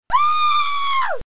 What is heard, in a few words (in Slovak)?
Vykrik; Revelation Sight and Sound SK